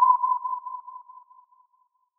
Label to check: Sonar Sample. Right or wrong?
Right